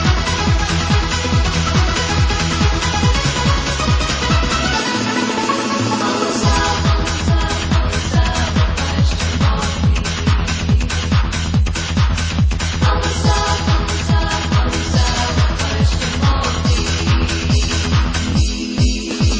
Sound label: Dance Remix